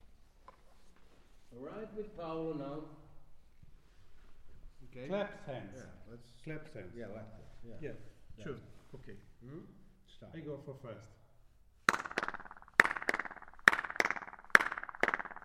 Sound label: clap hands